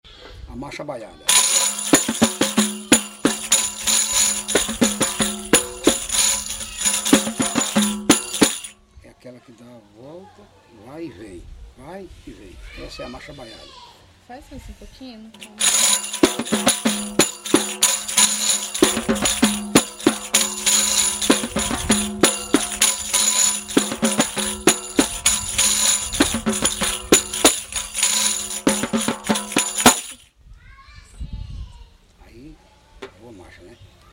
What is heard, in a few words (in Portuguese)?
marcha baiada